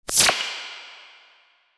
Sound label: flash invisible effect